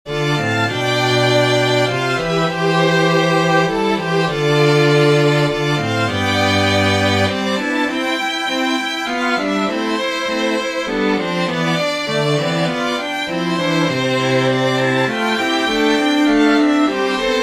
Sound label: Prelude in C